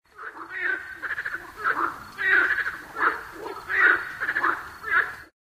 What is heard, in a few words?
animal call frog; Source Engine; tf; lair